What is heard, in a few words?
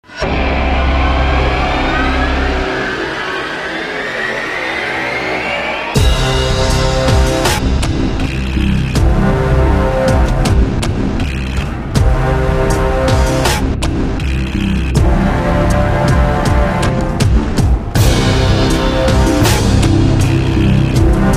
monster trailer